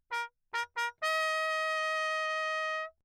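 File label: trumpet mute Lechner Bach signal; Perinétventiltrompete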